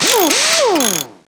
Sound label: sentry unbuild